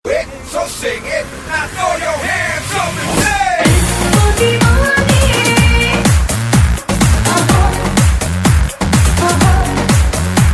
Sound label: disco ringtone